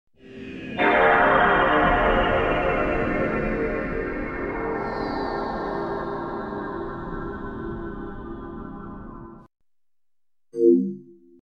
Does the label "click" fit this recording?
No